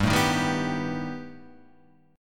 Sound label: B Augmented 7th G